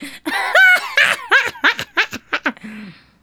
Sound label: Female Laugh; Hip-Hop and RnB Vocals; Laughs; Sonic Foundry